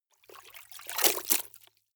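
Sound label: clothes water up down medium, household; haus-garten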